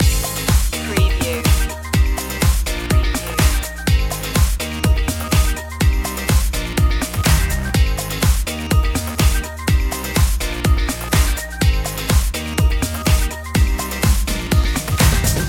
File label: beach dance loop